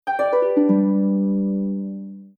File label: Power Down